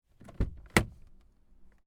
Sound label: car door open internal; spoteffects